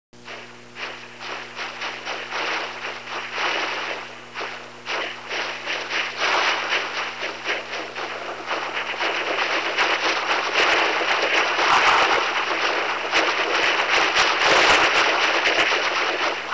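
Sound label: music simulation, cochlear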